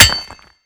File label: pickaxe use